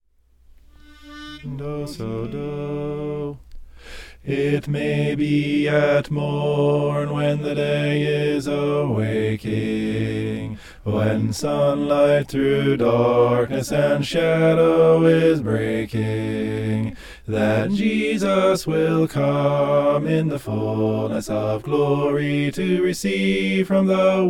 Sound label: bass; it may be at morn